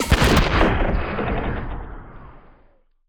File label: Distant Sci-Fi Explosion